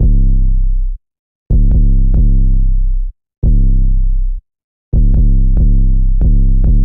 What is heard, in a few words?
Venture 808 Bass C 70 bpm, Trap Drums; Trap Drums by Sound Oracle; Ableton; Factory Packs